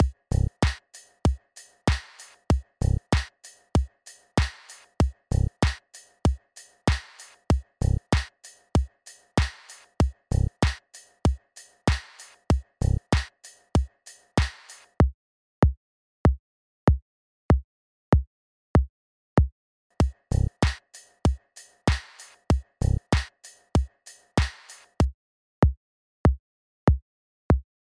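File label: layered with transient and distortion, kicks; for kicks; bzarvin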